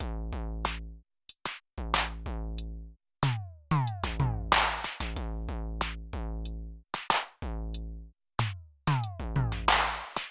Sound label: nord drum 2